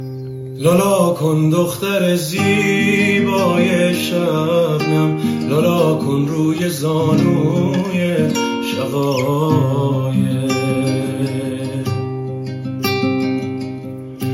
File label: lala kon gitar; لالا کن